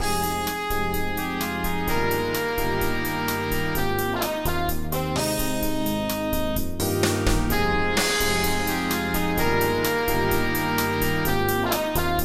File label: jazzer